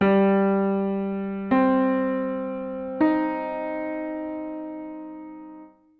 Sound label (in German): moll arp